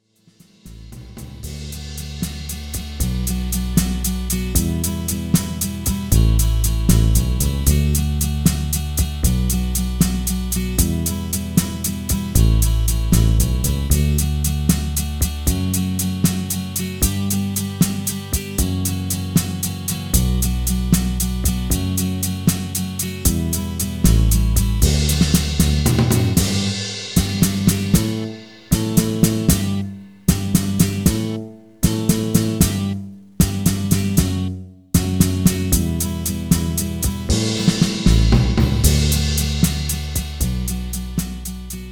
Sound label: Instrumental